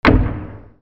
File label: DOORS